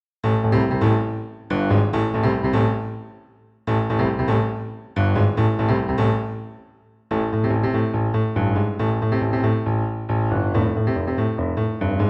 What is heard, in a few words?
Funky Chicken Piano Solo